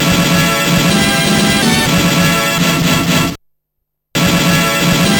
promo jingle, Trackers; Modland; Rogue Male

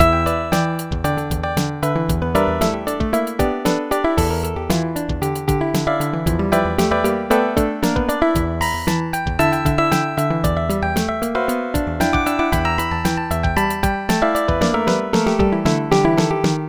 Jazz; Sequencer One

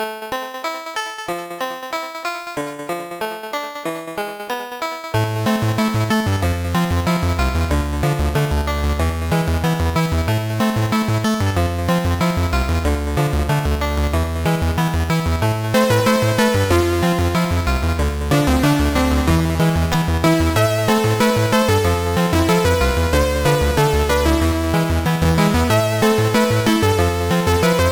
synth song; MED; Voyager

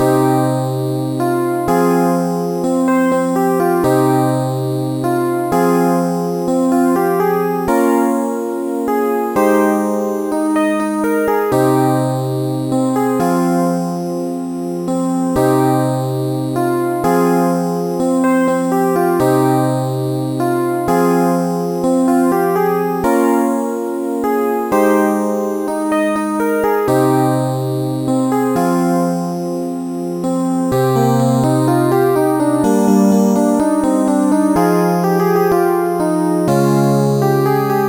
el piano piece

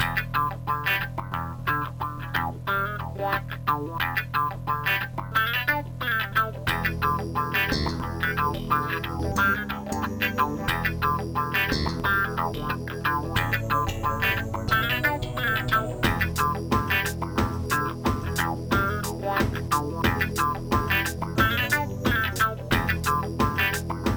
rythm 'n' jazz; Trackers; Modland; Klown Killer

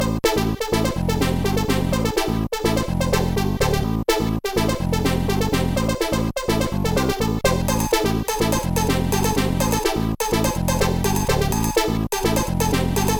happy bass beat; Erekose; Trackers; Modland

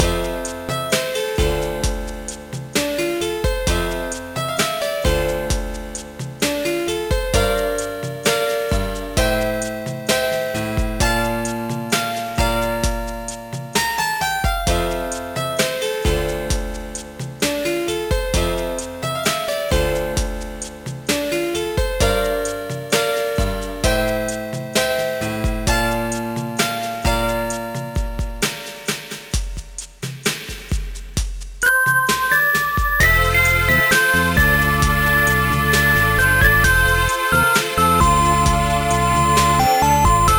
slow jazz

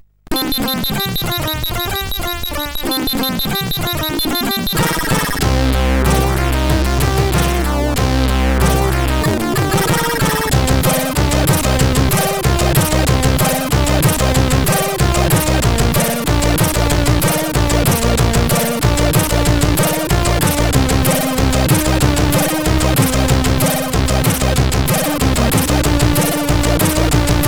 CSG 8580R5; sid

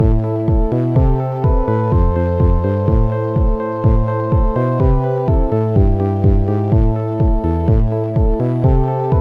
forgotten starlight blues; MusicLine

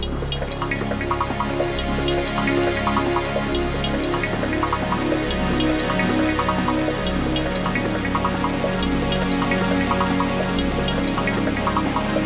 break trance, mod

